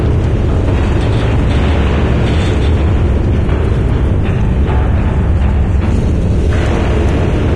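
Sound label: silo door loop close; video games; ambient; source engine; portal2; outland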